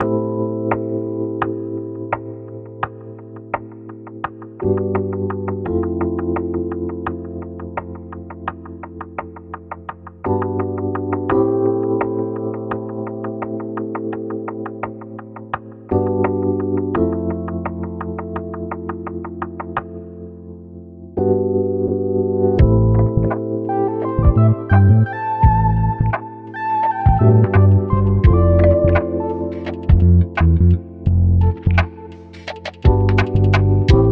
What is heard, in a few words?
jazzy